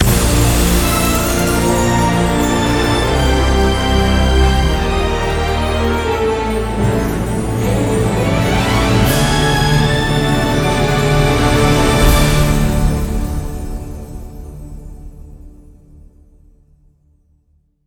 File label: mega Win; netent